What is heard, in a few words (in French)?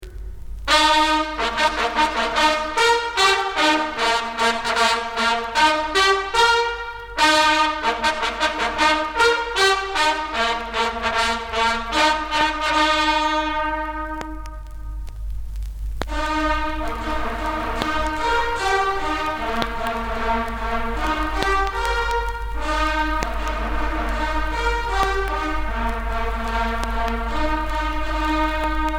L'extinction des feux (trompette), Sonneries règlementaires en fanfare